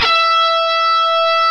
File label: ROCK GUITAR